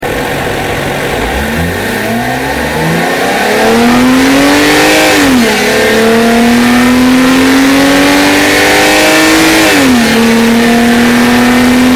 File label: 325i Sports Hard Acceleration Outside